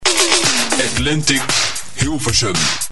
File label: drums; Atlantic Hilversum; Atlantic 99,5 jingle